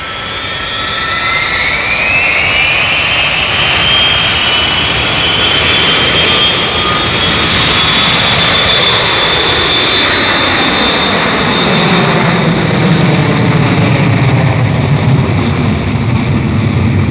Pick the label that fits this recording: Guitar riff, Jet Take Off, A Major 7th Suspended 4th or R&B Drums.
Jet Take Off